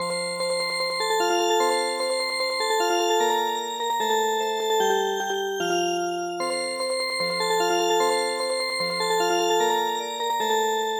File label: Dance Music Box; midi; traditional